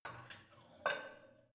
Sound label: barbell light plate put on hit soft